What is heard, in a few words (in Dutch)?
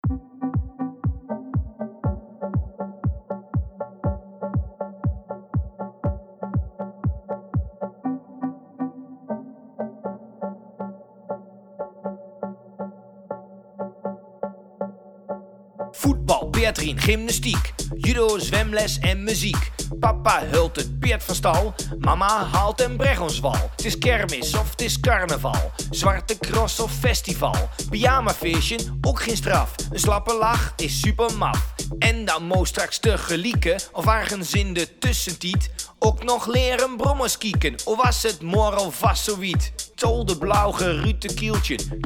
Drok met rap; bovenbouw